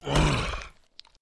zombie hit

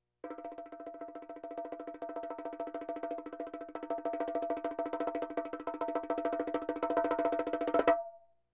Percussion Bongos Tympani rolls Gongs Drums

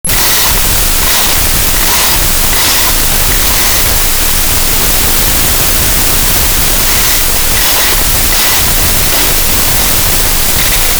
Combination Lock Opening; 1001 Sound Effects; Foley

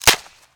Baseball Bat Break

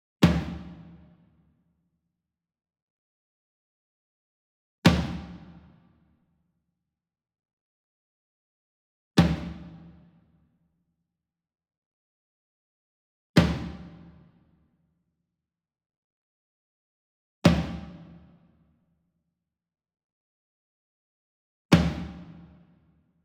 SINGLE DRUM Tom Concert Hit Soft Beater Medium; boom library; Cinematic Strikes Construction Kit

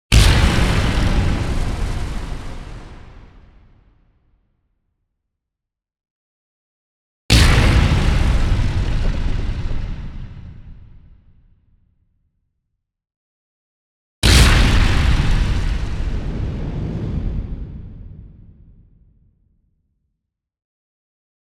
EXPLOSION LONG Rumble Crumble Distortion; boom library; Anime Essentials

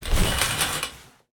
Shower Curtain Open, Bathroom; 1001 Sound Effects

